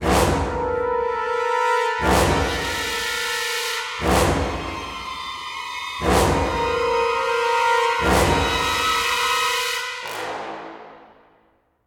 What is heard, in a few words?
Stinger Boss; Grom13